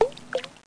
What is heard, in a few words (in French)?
plouf; Emme Arc En Ciel Et Le Lagon Merveilleux